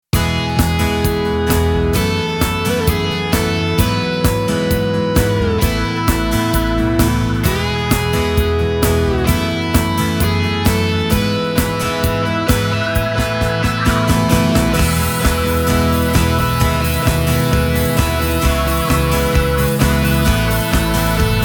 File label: Kronos OASYS Pop Rock Mix; Organimation